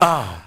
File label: "ah"